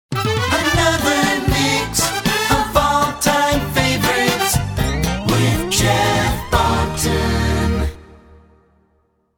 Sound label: Jinglebells